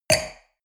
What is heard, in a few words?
wine glass place table medium; berufe; soundpool